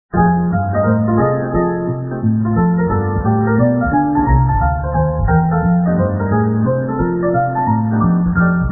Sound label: piano